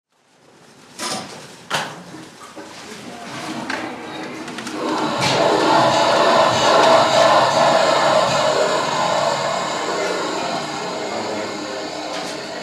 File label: HOSPITAL EQUIPMENT HOSPITAL: Operating room, switching on keller machine.